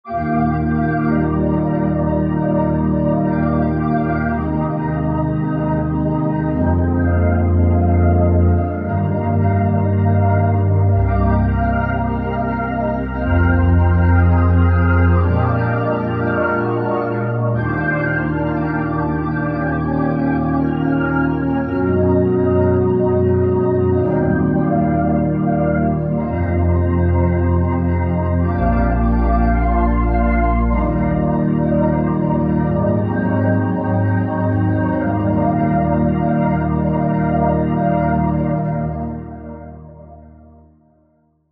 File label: Hammond test